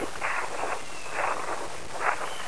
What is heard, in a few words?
better jump